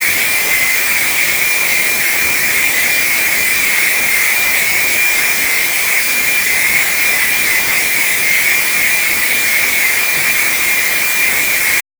noise tone long

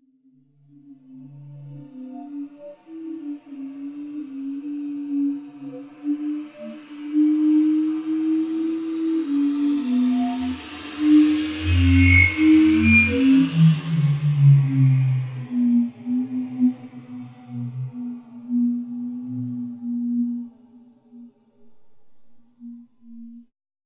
moto

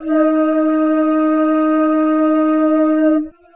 flute mod removed

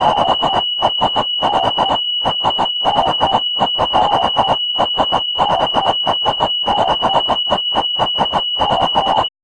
clicks long

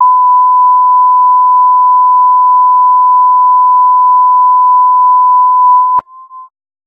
sine amp mod removed long

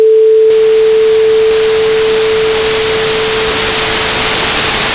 sine noise short

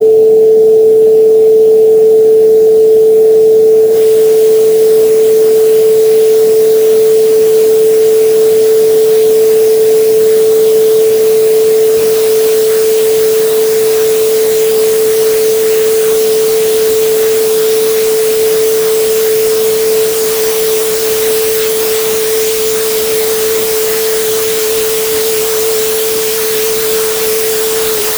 sine noise short long

